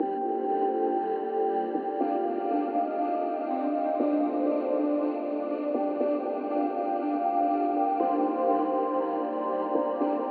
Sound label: flying Dmaj; pad